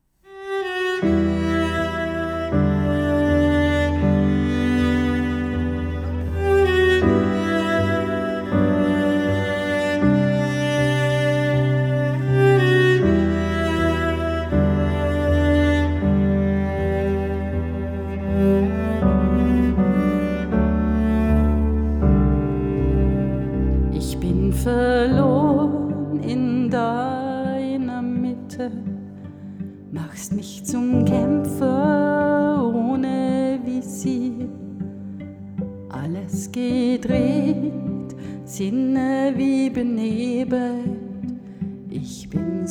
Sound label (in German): Gesang Cello Klavier